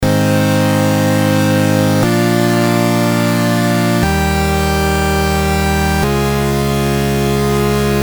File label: V-SYNTH PAD Original